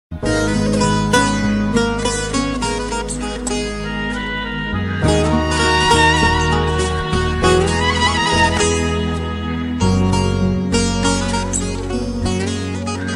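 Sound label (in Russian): ochen grustnaya muzika, very sad music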